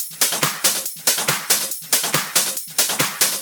Loop 140 BPM; vengeance essential club sounds-3

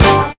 hit g; house; PS-28 Vocal House Samples; hits

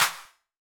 Clap; Vengeance Samples; VEC1 Claps

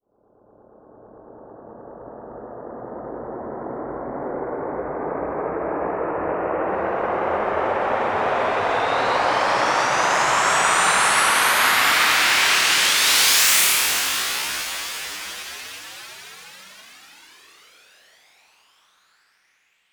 Uplifter

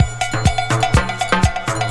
mix percussion loops, 125

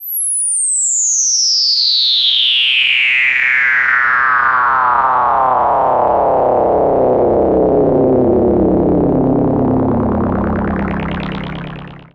Downsweeps

